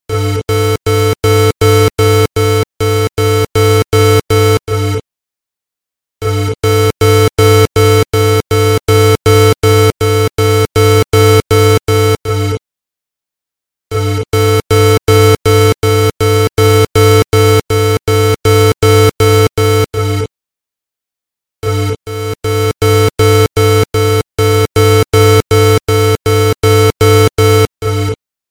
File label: Alarm Clock